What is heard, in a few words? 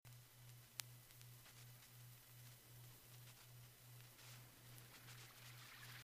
high pass filter